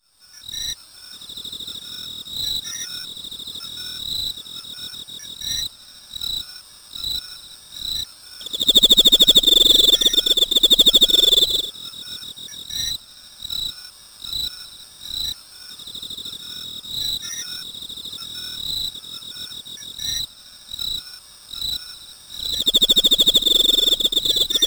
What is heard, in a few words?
Cricket Ambience